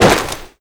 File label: foley gear land